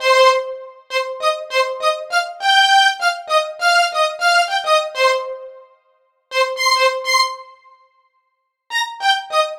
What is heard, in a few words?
Funk Strings; сэмпл струнных